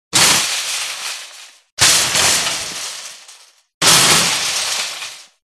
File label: shopping cart